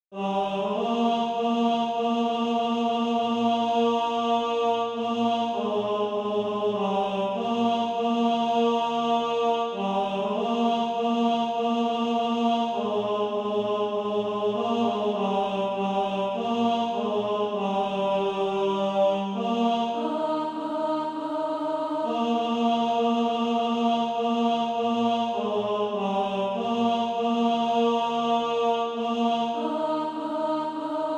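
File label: tenor, Practise Music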